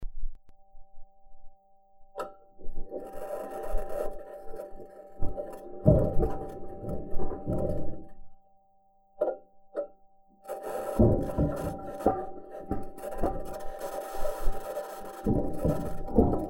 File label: Rover Drive Highlights, Mars; Sol16